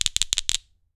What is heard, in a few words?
ILI ILI, HAWAII PERC; AKAI S6000 CD-ROM; WorldSounds